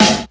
crunchy snare drum a key, Royality free snare drum sound tuned to the A note